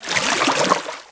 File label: WATER MOVEMENT